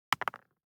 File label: DICE on Neoprene, Throw and Roll, Mini, 1 One Die; Samples; ArticulatedSounds; DICE Sfx Party (Extended)